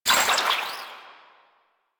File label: Stomp Shockwave End; Warframe; Caliban